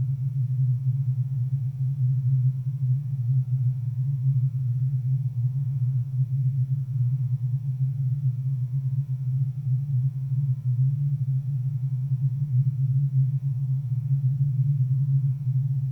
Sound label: loop